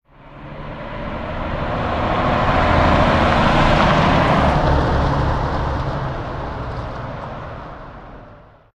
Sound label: car passby; Source Engine; tf; mvm warehouse